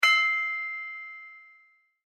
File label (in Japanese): リングサイドのゴング